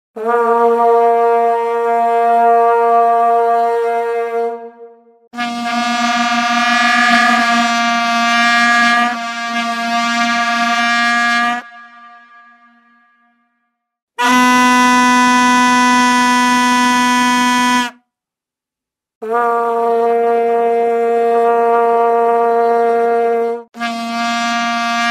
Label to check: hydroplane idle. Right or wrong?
Wrong